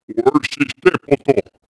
ithorian run, creatures; Star Wars Obi-Wan; XBOX

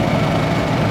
Loop, Engine, golfcart

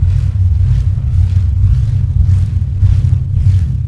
loop, ships engine; Quantum Redshift; jaya

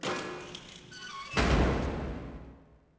jail door; AMBIENT; Pirates Of The Caribbean

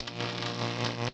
light sparks; Tony Hawk's Project 8; XBOX

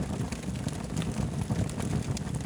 small fire; Soldier Of Fortune II Double Helix; XBOX; ambience

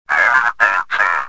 probe talk; Star Wars Jedi Knight II Jedi Outcast; XBOX

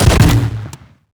shoot m79; weapons; Max Payne

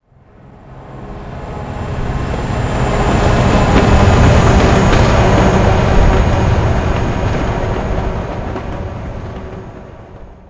Train Passby; vehicles; coruscant

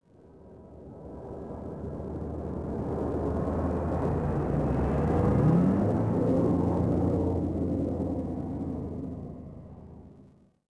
skyhopper passby; Star Wars Obi Wan; XBOX

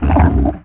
grab, Dino; Spino

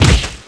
head smack, skater; Tony Hawk's Project 8; XBOX

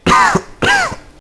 cough; Max Payne; hotel

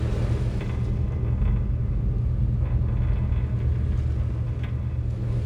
boat, hongkong; ambience; Soldier Of Fortune II Double Helix; XBOX

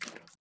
fs mud, footsteps

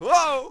pre fall, Rider

